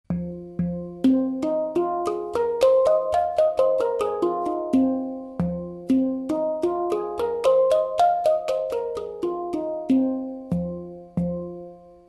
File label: Banshiki-Cho, hang